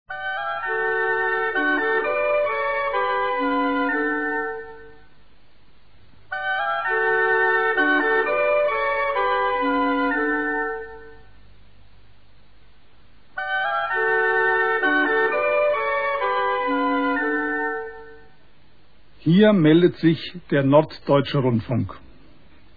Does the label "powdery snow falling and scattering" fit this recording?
No